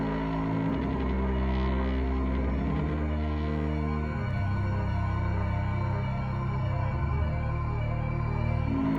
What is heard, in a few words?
High Action Halloween; lethal company